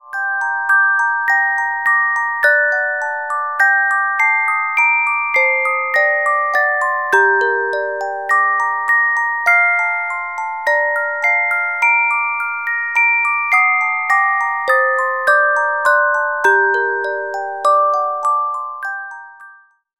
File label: Carillon Music Box Melodies